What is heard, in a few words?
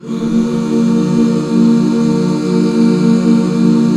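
Eb minor 6, back chords; Sound & Vision - Gigapack II CD 1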